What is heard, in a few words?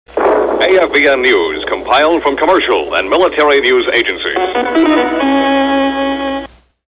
News Closing Theme; chairborneranger